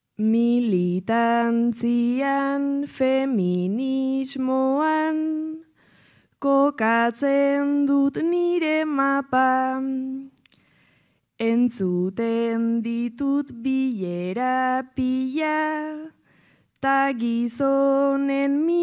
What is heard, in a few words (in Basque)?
bertsoa; zarautz